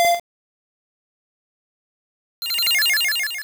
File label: Softboiled; attack moves; pokemon; gen 2; gsc